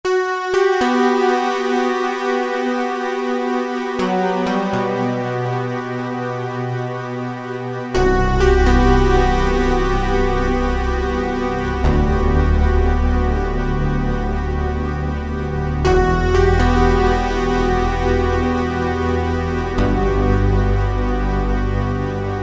Yamaha TG33; SC-Wire

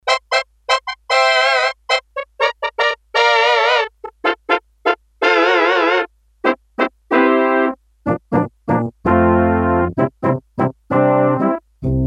Synth Brass, Korg DS-8; Factory Patches

